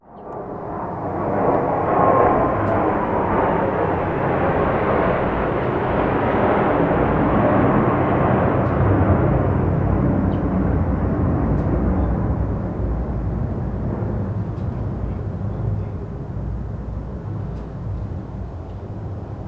f16 fighter jet passing by dog barking and engine sputtering; fx